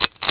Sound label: Button